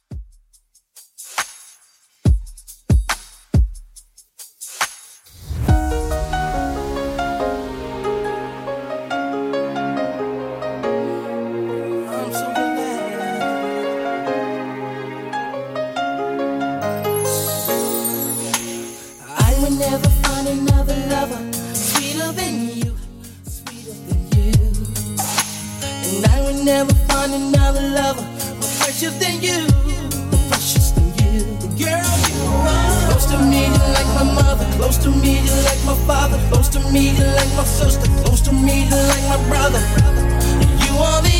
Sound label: 2ks Redrum 70bpm